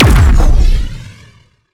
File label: Sciweap Shot Large Blaster Plasma Cannon; Robobeat